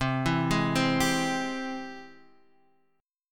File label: G sharp Augmented Major 7th C